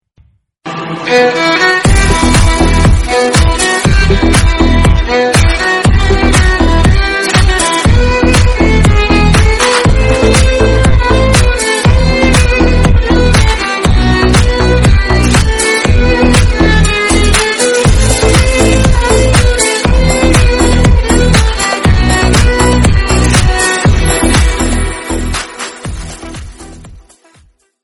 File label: no copyright song; ncs